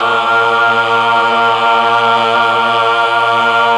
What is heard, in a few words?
belchoa, Voice; Notes